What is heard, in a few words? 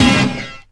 glitch